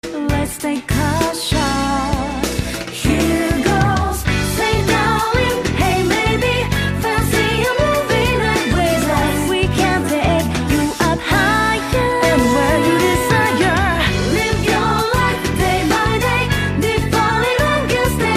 Cinematic Tale

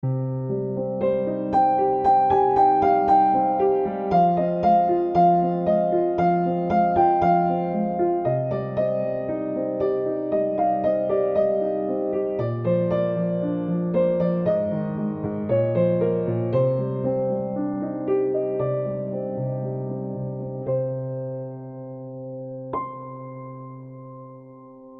Piano

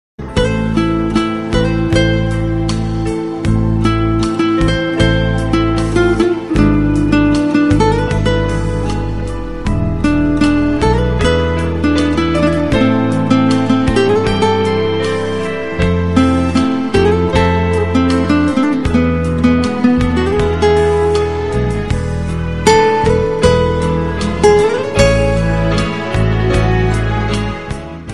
Love Story Guitar

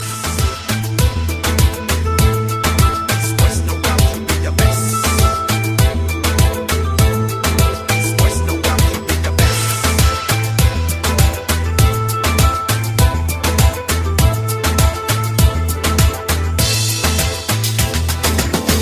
Guitar N Bass

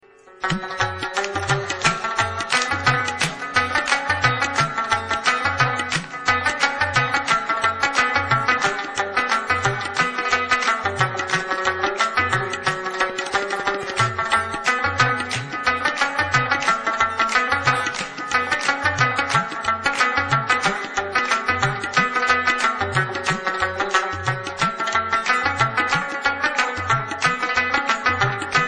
Rabab Music

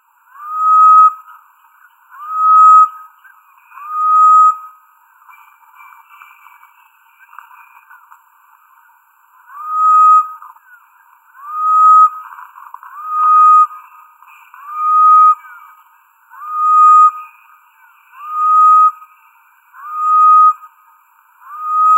Grey headed bush shrike

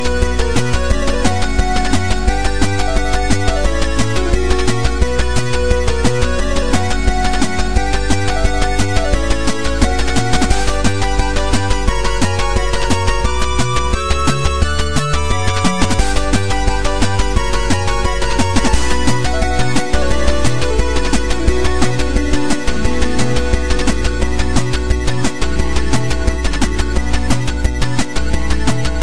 8 Bit; Megaman X

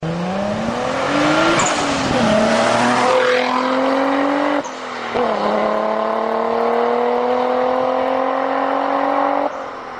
G35 Twin Turbo

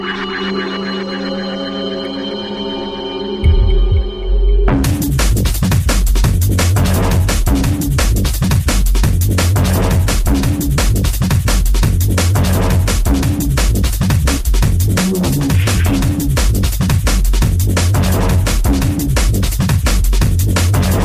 Dnb

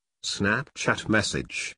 message; Snapchat

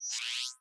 Fax; Halo

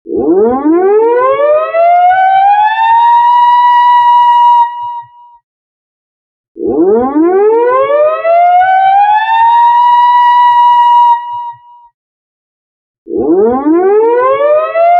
Navy Alert

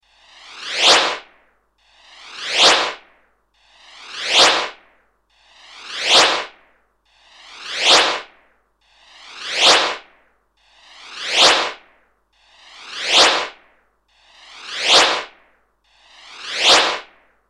Whoosh

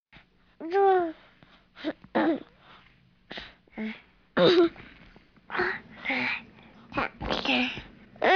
Baby Talk

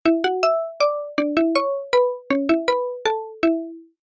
Nokia Orginal

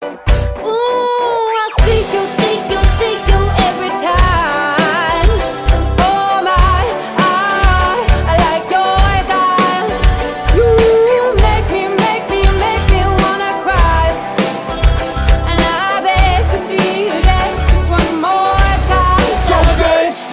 Dance metal

